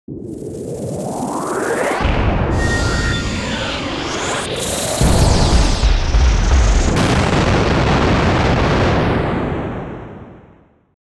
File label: deep focus